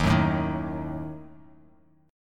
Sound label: D sharp Diminished D sharp Bass Standard; strummed; D#dim; 11,9,7,8; JGuitar